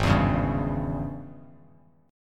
Ab Major 7th Suspended 4th Sharp 5th Ab down Bass Standard; strummed; JGuitar